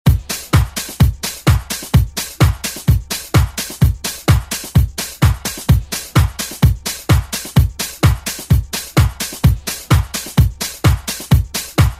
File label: Oldschool Redrum